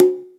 JV KIT; Pro-Rec - Synthsonic; JV ANALOG2

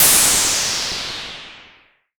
FX, SCI Prophet-5 synth

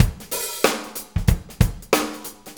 Shuffle Loop, Full Kit Loops; Sonic Foundry (Sony Creative Software); Loops & Samples Drum Tools

